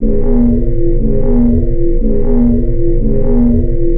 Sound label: Xray Loop